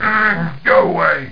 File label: lava; Total Chaos Nukem 3d Pack